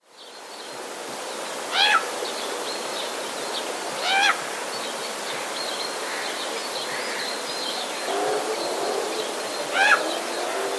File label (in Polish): sojka; dość wysokie okrzyki